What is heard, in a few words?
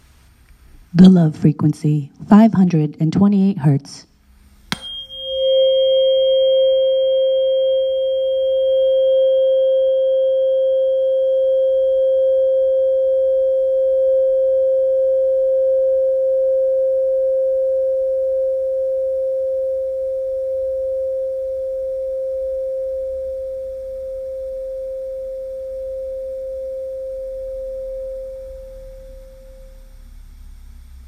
528 Hz; The Love Frequency